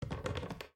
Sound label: Creak; lethal company; ambience; windows